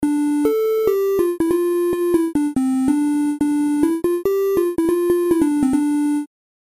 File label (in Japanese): サイン波; スパンカーの作り方